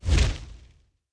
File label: near Win Flame; warlords